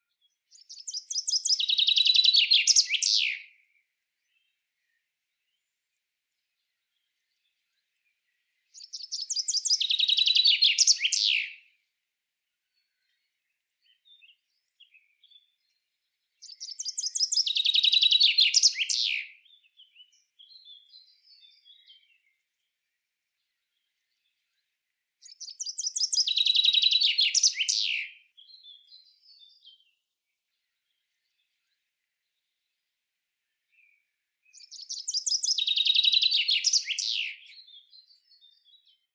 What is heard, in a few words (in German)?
Buchfink Fringilla coelebs; vogelstimmen; PHILLIP; omas vogelstimmen